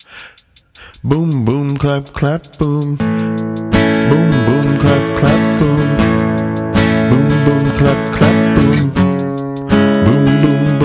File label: boom boom clap clap